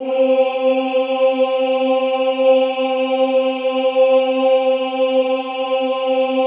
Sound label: OOH C3, Classical Choir; Best Service; Extended Classical Choir; OOH MIX